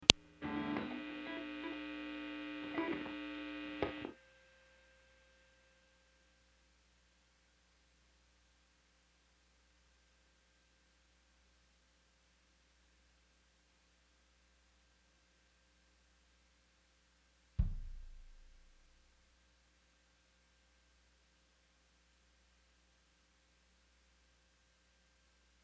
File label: Ambient Guitar Mix